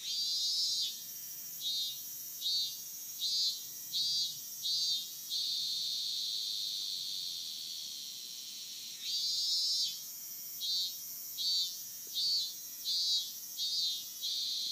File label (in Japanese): ミンミンゼミ